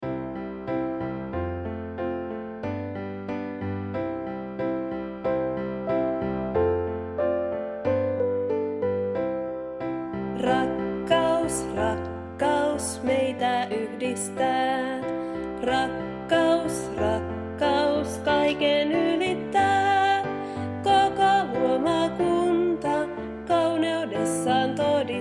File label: Song, FI